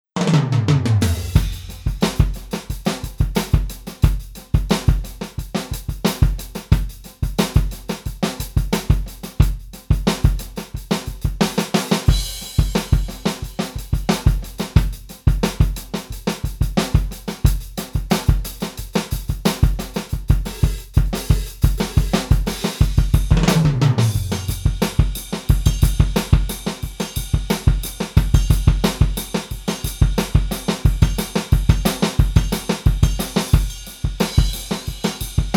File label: small room falling tom, drums only; tascam